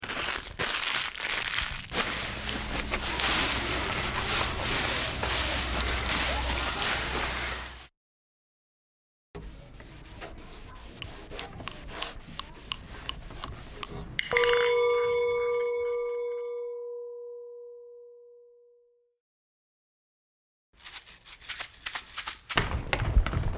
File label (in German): Geräusche aus dem Klassenzimmer